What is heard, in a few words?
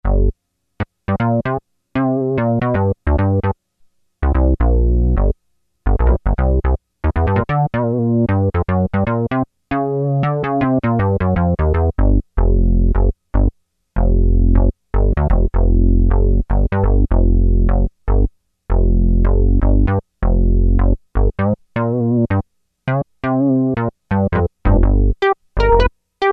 Bass 1, Roland Juno-60; Factory presets